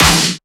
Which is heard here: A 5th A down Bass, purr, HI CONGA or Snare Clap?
Snare Clap